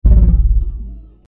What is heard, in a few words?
explode; spacetracer